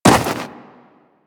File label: Run Snow, Footsteps; DE; Warframe; Tenno